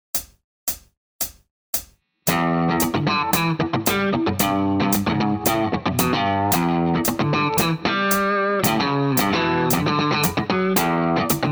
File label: left hand muting